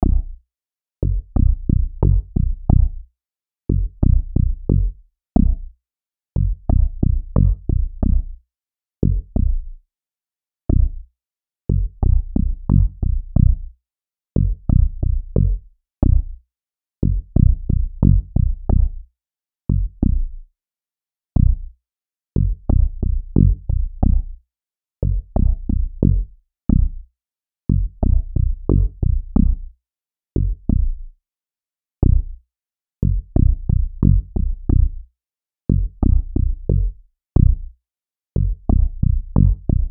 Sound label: Cm90 Big Bloo Groove